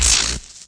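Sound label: lightning gun hit; gusanos promode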